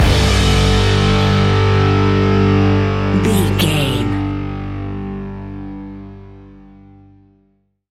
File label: stinger, A rocking music track with rock guitars, heavy rock drums and thumping rock bass lines. Heav; A rocking music track with rock guitars, heavy rock drums and thumping rock bass lines. Heavy, loud, aggre